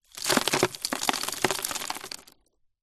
glass pieces drop sprinkle onto thousands more small broken plastic tub; foley; zapsplat